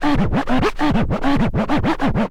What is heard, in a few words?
scratch kit, vinyl